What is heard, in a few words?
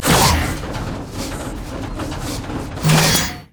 emote laugh; rumble skin03